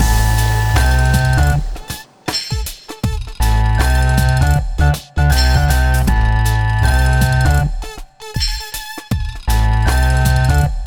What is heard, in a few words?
Factory Loop